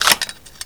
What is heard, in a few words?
Inf Weapons Foley 3rd; Red Orchestra 41-45